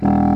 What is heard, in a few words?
DOUBLE REEDS; OMI - Universe of Sounds